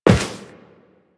Step Metal, Footsteps Walk; DE; Warframe; Tenno

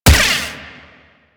Rifle Lancer Gun Shot; Warframe; Narmer

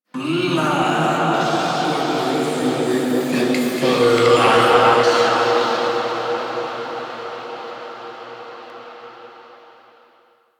Cave Echoes; Ambience; Queen; Warframe; The War Within; Golden Maw

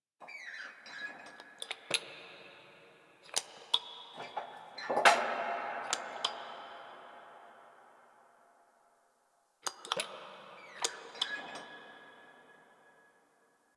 Dungeon Island Cave Crumble, Ambience; Warframe; Duviri

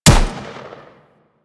M16 Rifle Shot; Grineer

